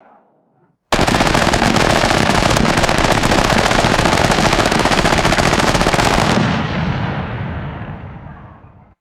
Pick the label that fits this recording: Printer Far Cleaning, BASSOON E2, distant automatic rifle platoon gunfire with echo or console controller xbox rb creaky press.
distant automatic rifle platoon gunfire with echo